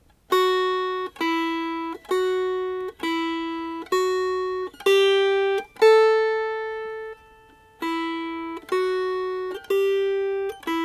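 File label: Melody, Dulcimer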